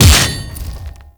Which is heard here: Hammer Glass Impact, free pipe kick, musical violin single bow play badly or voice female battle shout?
Hammer Glass Impact